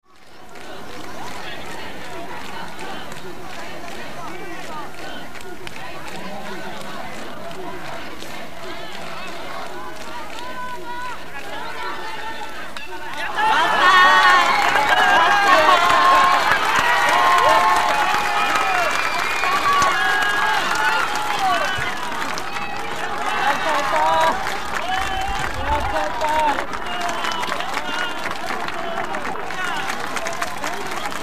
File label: sayonara